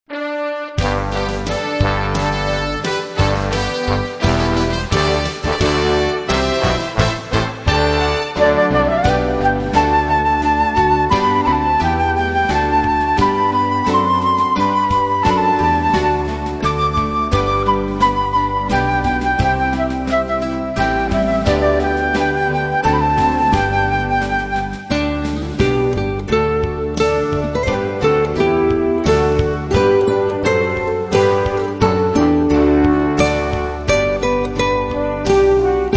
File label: Instrumental Hymns